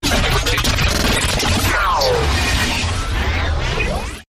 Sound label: Tube Chaos, Taylor Grand